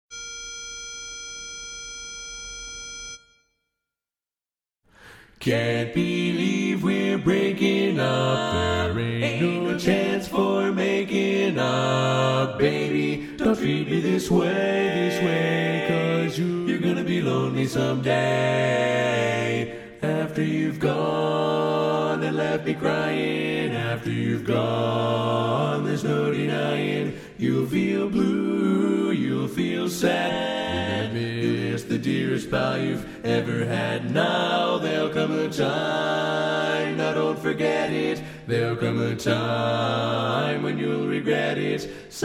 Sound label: Bass, Learning Tracks